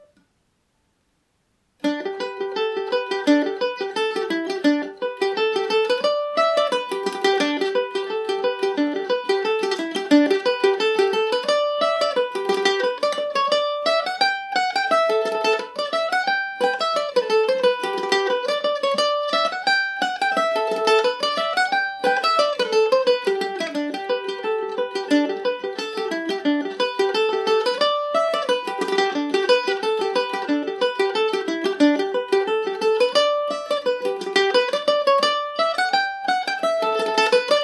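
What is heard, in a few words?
reel, A dorian, irish mandolin; The Glentown Reel; G&O #34 mandolin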